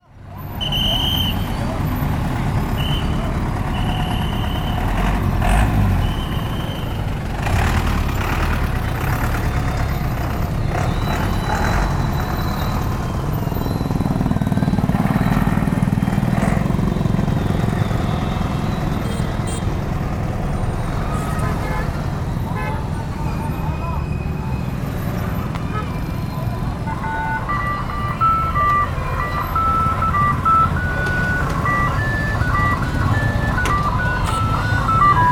Cambodge rues de Phnom Penh

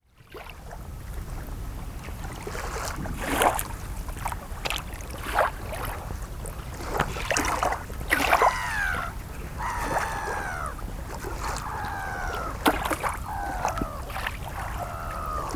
Lettonie Jurmala plage

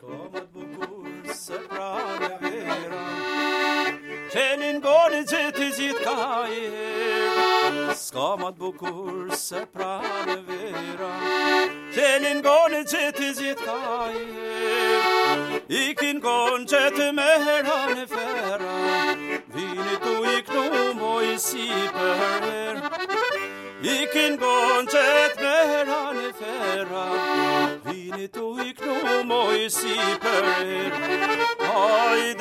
Air Albanais

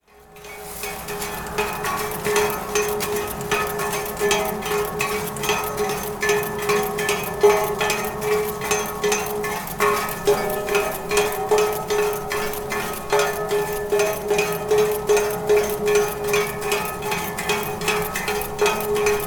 Estonie Ski quand le ski s arrete

